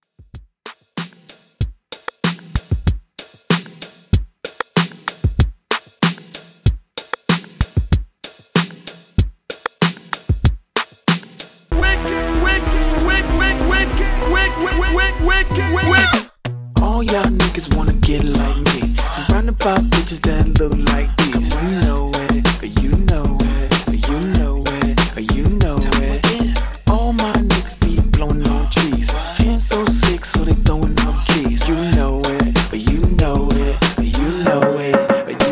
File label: 95Bpm